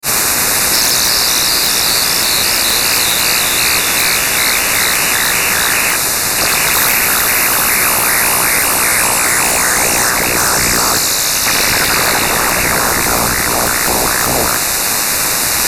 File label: squiggle